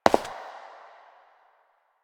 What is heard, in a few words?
Step; Warframe; Koumei